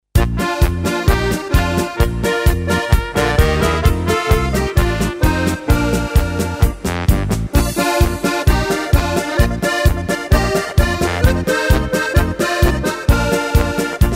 Oberkrainer Polka

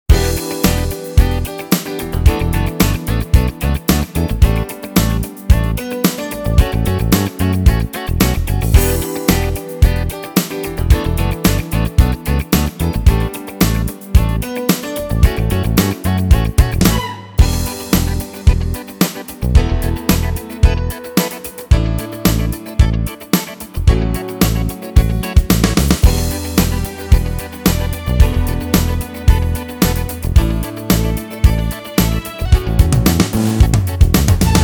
Disco Funky

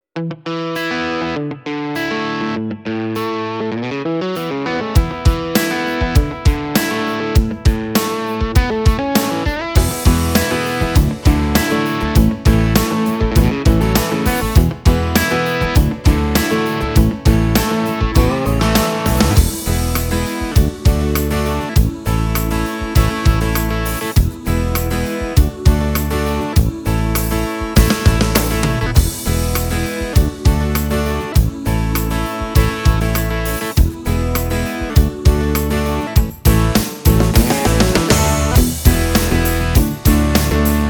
Alabama Country

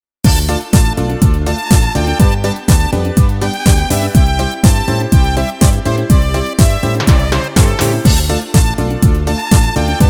Hands Up Disco

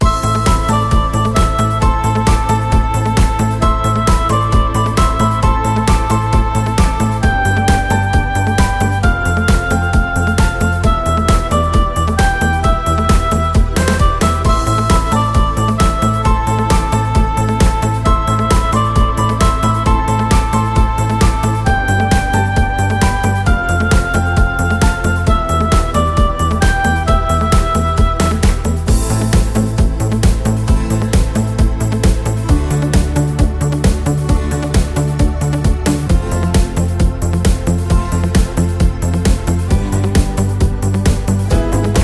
80s Synth Pop

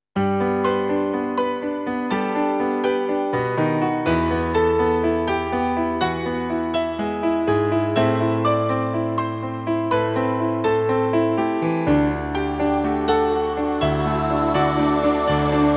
Disco

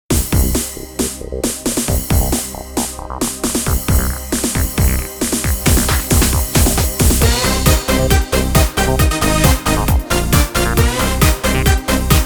Folk Techno

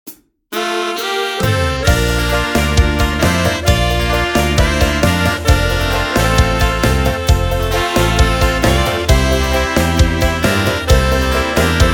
Tango beguine

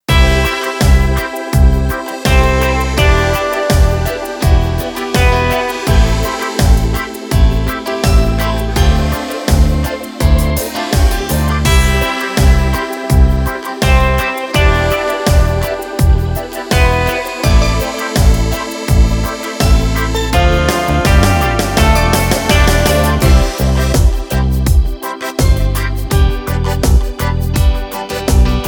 Reggae

